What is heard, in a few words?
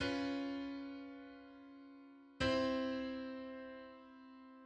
Tritone substitutions It6 I